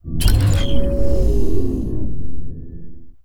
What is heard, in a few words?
sandstorm mlrs stop; gdi